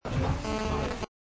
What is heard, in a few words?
cellphone speaker sounds